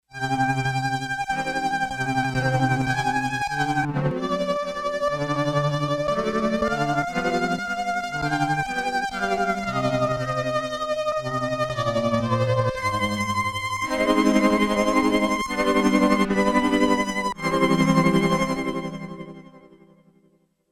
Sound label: Rotary Str, Yamaha DX11; Factory Performance Voices